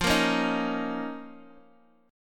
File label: F 7th Suspended 4th Sharp 5th, Guitar DADGAD